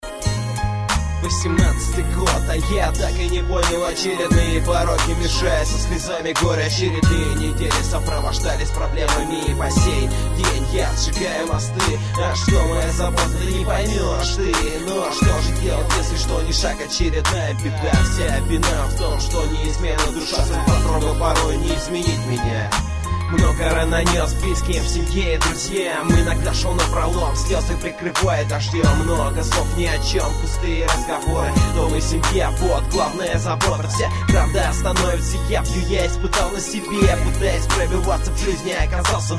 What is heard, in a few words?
instrumental